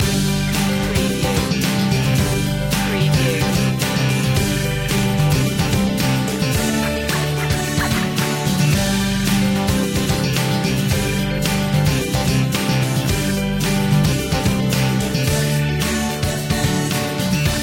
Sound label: shopping centre loop